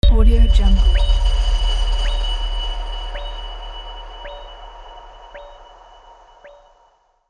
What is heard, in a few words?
ultra boom hit